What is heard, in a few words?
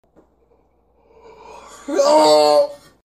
moan